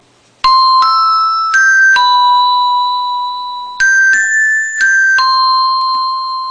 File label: 1 Missed Call Piano